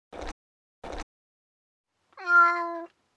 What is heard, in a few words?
Cat Idle